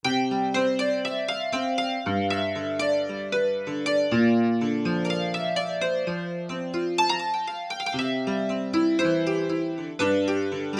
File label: Chorus Piano; Yamaha TX16W; Yamaha CFIII Grand Piano